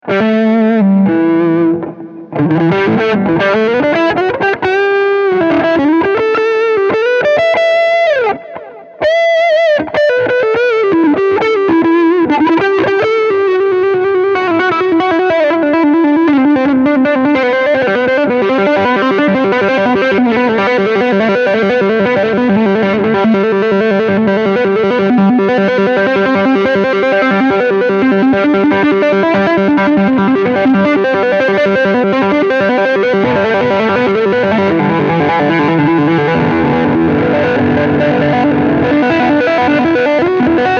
Slurs Again

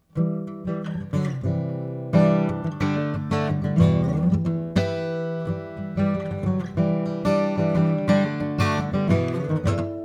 Harmonic; Cascade X15

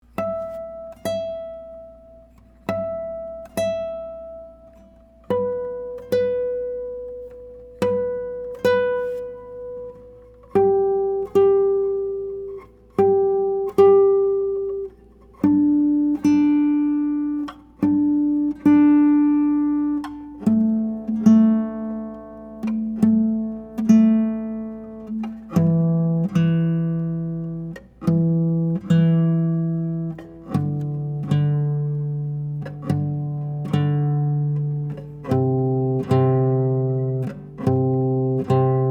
Intonation; Sakurai; Intonation Test (Harmonic + fretted note @ the 12th fret)

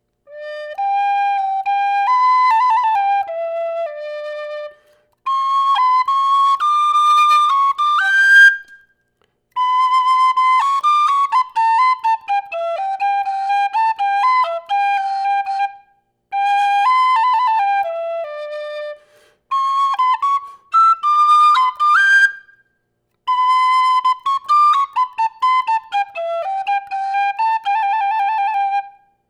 Tin Flute; AMI KM100f

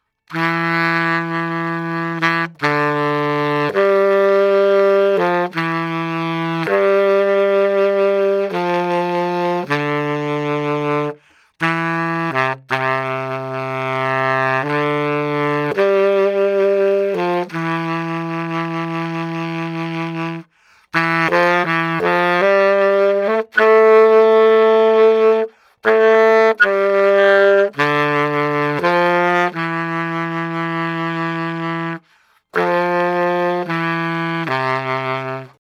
Bari Sax; AMI UM17

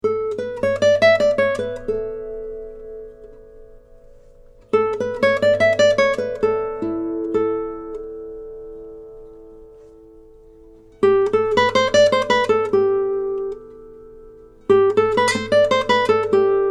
Resonance; Ramirez; Resonance Test / (Showing Sympathetic Resonance); Presonus ADL / 600 preamp; Rosetta 200 A/D converter

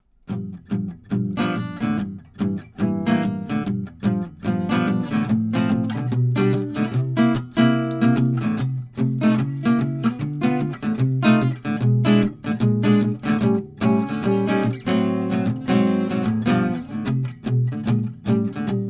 Jazz

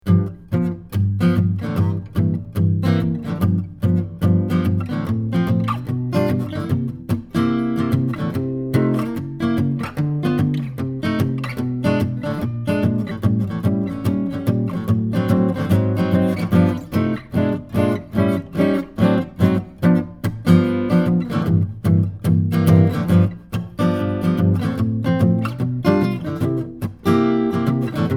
Jazz; SANTA CRUZ OM/PW; AKG C34; straight signal with no additional EQ, compresson or effects

